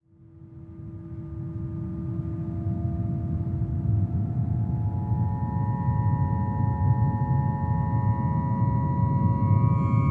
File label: Short Wind Harp Recording